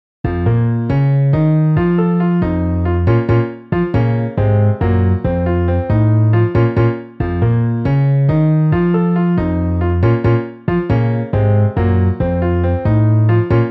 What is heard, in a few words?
Piano Bass MIDI